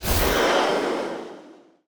Ice Magic Cast; RPG3; Cielonos; Wwise

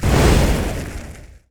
fire impact; MagicArsenal

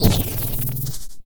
Plasma Magic Ball Cast; Cielonos

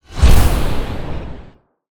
Dark Magic Impact; Cielonos

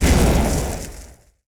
fire impact; MagicArsenal

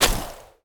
magic cast frost; MagicArsenal

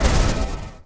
shadow impact; MagicArsenal

